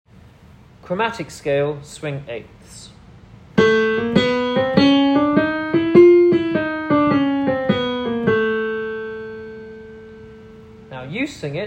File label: Chromatic scale